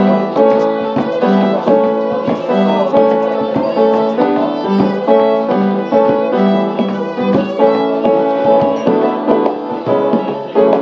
folk music in Scotland

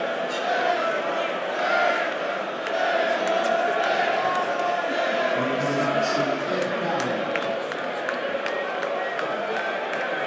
Ashes crowd noise 1st wicket

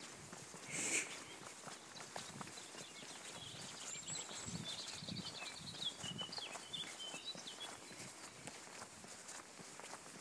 birds walking cotswolds

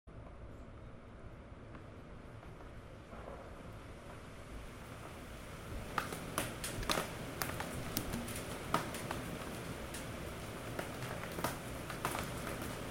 hail and thunder in west london

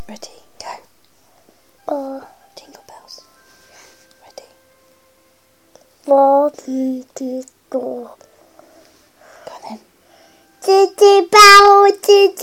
jingle bells

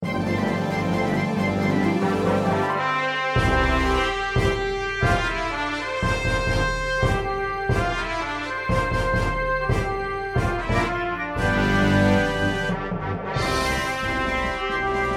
star wars with backing track

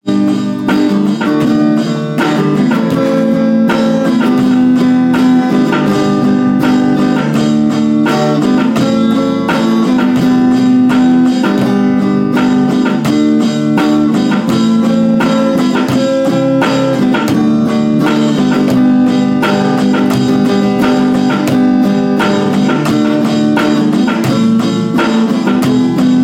Pop song

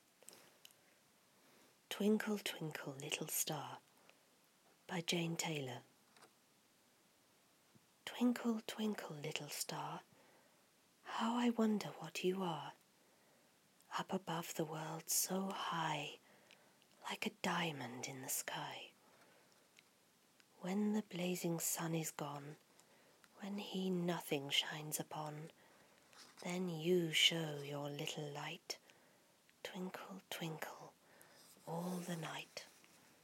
twinkle twinkle little star; jane taylor

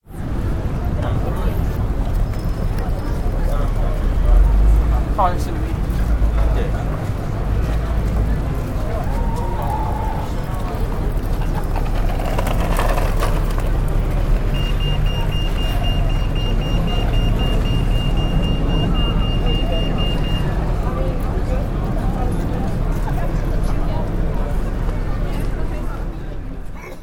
pedestrian crossing with audio signals in reading

